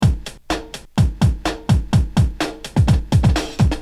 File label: drum loop